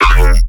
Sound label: bass hit overtones E, Bass Stabs; Virtual Riot